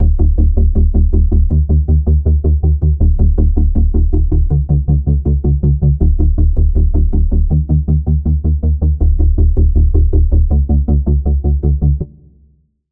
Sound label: Pulse; Routed Sound; Serum 2 Expansion - Quantum Enforcer Vol.1